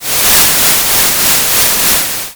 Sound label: static